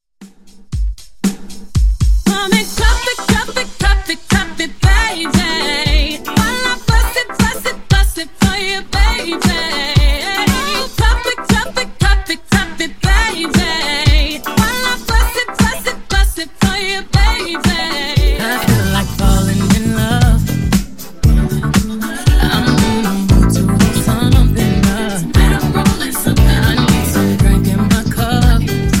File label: Funk 117Bpm; Mashup Blend